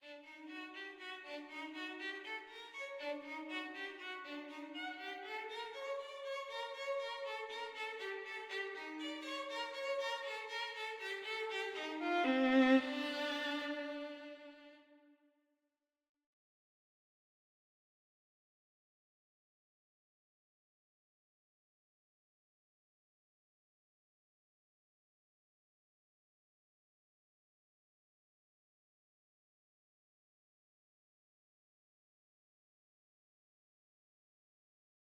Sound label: violin; heroes of nerevelon